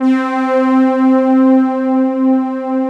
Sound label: LUSH BED